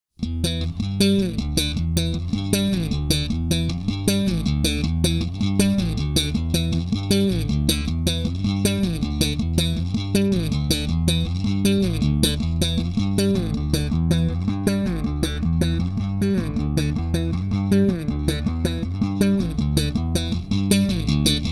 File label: Pedal